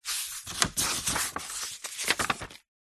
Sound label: household book diary page turn